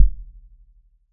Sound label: 808 Deep Kick